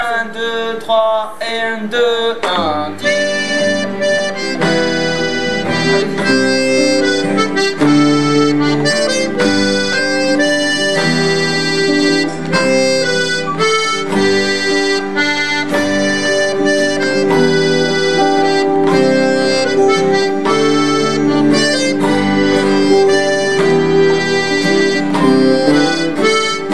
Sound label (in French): Inisheer impro, Mazurka irlandaise